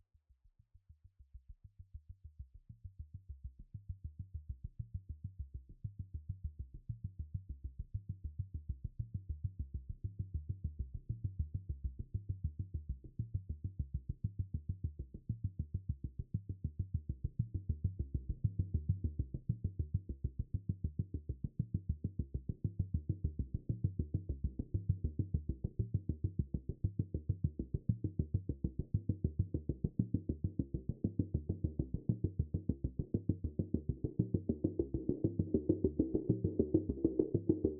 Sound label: Synths III & Keys; risk of rain 2 engineer edition 2